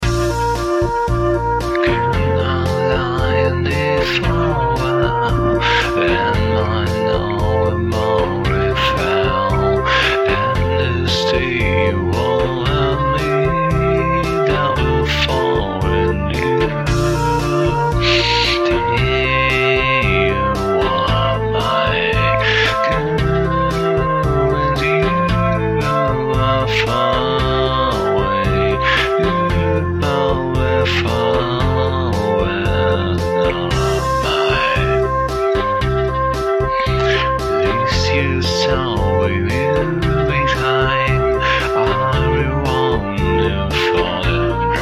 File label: booing